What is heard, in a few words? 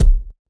Ball Player Hand Hit Tackleball Hard